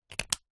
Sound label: technology camera dslr lens cover click on; zapsplat